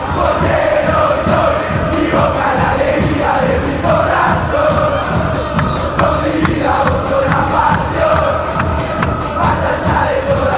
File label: not even death, chants